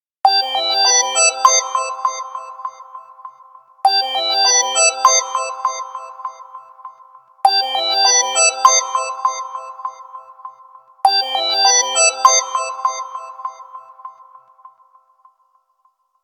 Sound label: Pure Tone, ringtones; Motorola MOTOLUXE-XT615